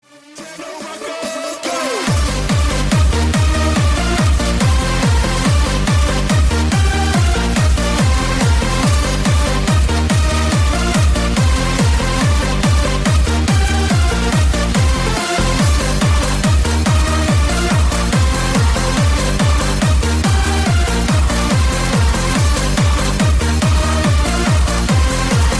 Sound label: Techno rocker